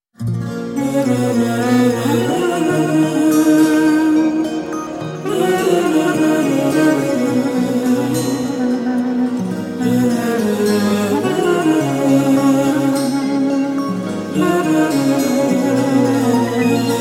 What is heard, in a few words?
Only Music